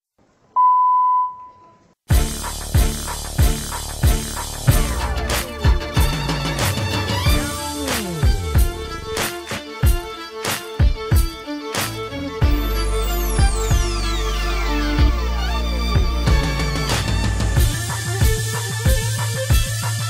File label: floor music; aisha gerber